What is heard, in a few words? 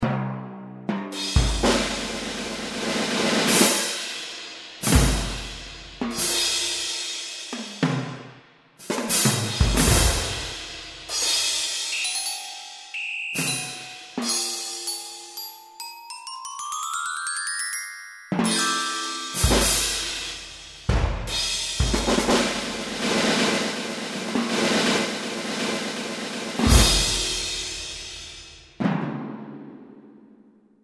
Big Drum Corp; Kurzweil K2000 Orchestral ROM